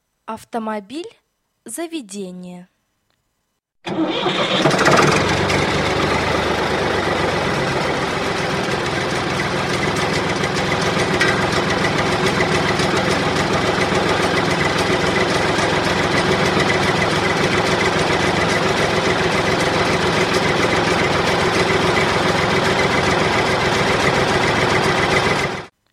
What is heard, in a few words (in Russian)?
Автомобиль заведение